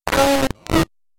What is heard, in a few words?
robotic glitch